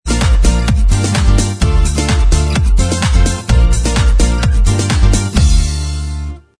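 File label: tv theme instrumental track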